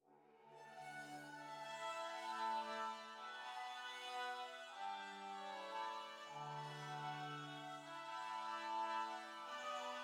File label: Sunrise Texture Arp BPM154 DMaj Hopeful Love